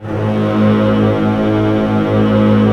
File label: STR D#0 FF; Orchestra; STRNGS.C0-C6; Sound On Sound - Peter Siedleczek's Orchestra